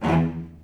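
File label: E1 arco short cello, right; East West; The Ultimate String Collection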